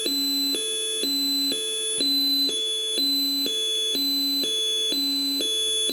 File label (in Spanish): tono